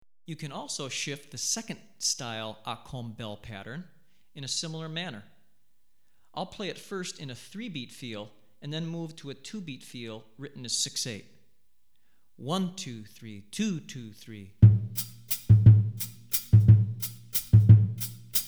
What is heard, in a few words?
Akom, Shifted Bell Pattern Second Style; Hartigan